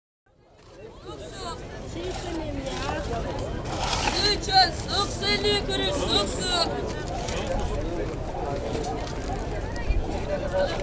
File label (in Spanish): turquia estambul puente galata bridge